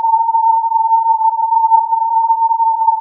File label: filtered input